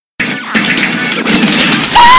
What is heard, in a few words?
fail sound